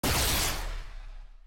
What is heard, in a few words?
skill hit; pyke skill 1